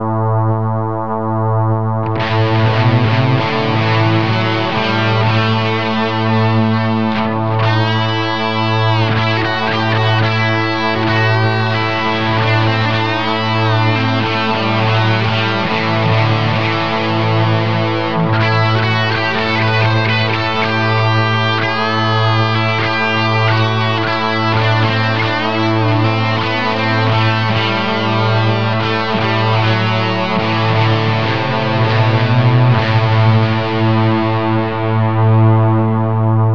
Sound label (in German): Dorisch Mit Drone